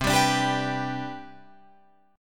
C Major 7th Suspended 2nd C down Guitar Open F, chord; CM7sus2 strummed; JGuitar